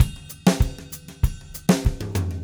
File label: Latino Lite, Latin Percussion, Drumset; Sonic Foundry (Sony Creative Software); Joe Vitale Latin Percussion